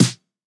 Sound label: Snare